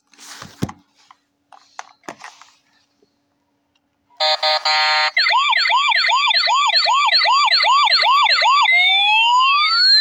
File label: police car small